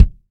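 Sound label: Acoustic Kick; Roland SPD-S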